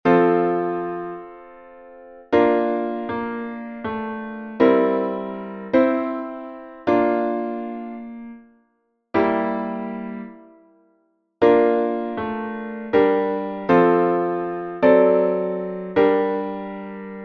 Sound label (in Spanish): entonacion piano; Só acompañamento; Entoación con acompañamento